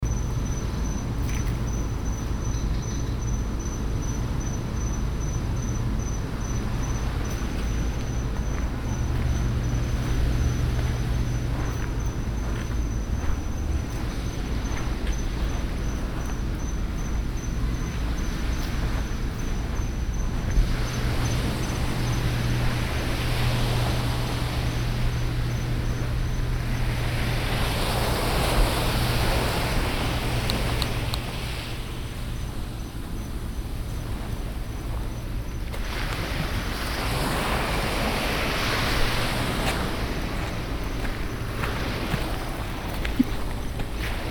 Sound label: crickets & waves; bahamas; Heaven & Hell in Paradise